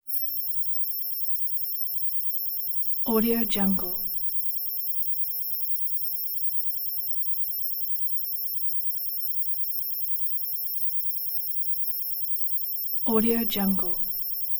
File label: telemetry constant high pitch synth beeps